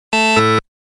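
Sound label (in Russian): доступ запрещен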